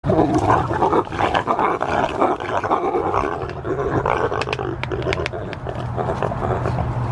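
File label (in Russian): zvuki pekari, peccary sounds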